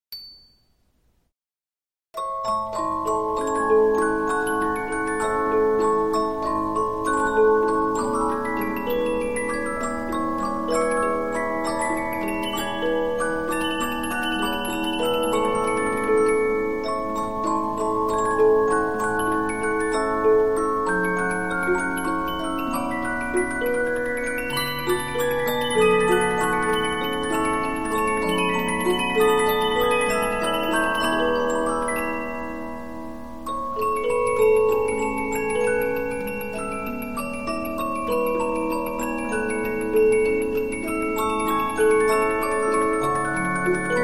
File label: Polyphon, 15inch disc musicbox